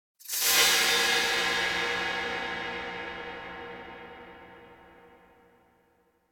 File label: Cymbal Strike Brushes High Tone Whistle Impact - metal; Hollywood Edge; sound designer's toolkit v2